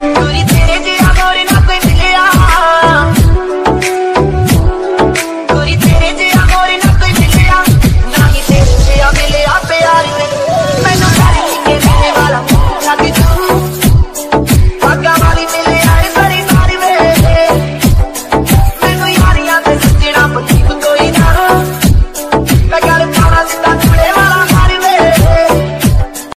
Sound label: hindi ringtone, bollywood ringtones